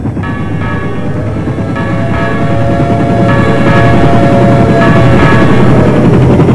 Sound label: TRAIN